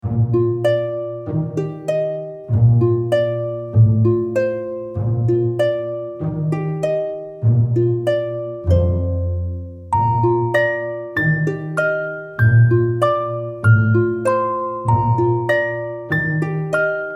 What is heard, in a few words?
Christmas Lullaby; Noisebiscuits